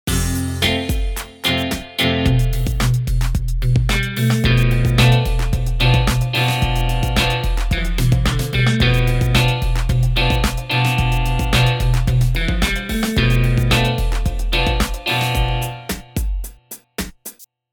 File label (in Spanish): musica